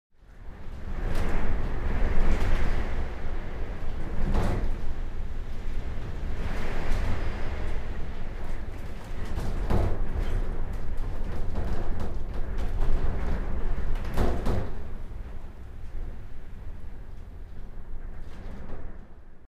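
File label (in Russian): порывы ветра удар по стене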